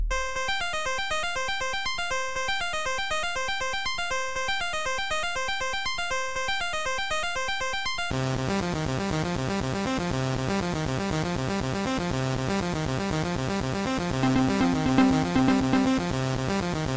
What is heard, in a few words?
remix electronica ringtone